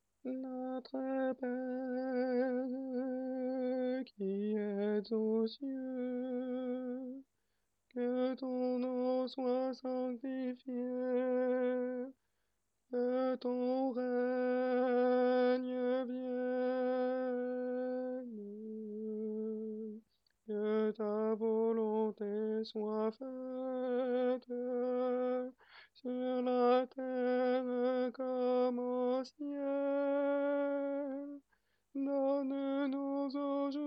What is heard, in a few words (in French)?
tenor; Notre-pere Rimski-Korsakov; mariage